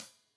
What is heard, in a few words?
Hat Loose, Hi-Hat, Cymbals Stereo